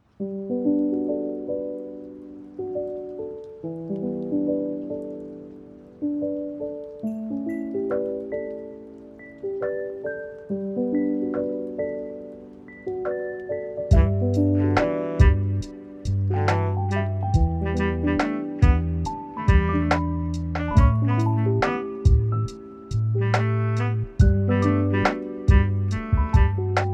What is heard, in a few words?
HIP-HOP LOFI Chillout Lounge